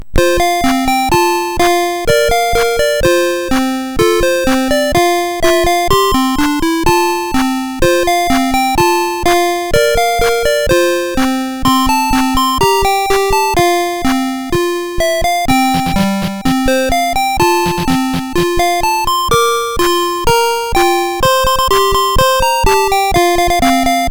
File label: MOS6581R2, 8BitChiptune; Picnic